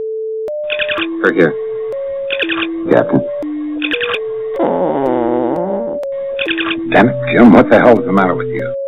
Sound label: fart; Captain Kirk